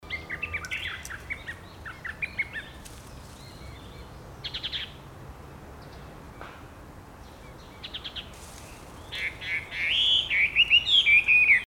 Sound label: gabicho hototogisu mane